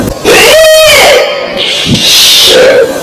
earrape warning

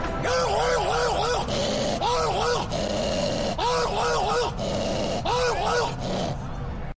man barking

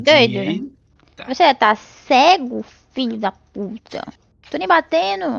rage

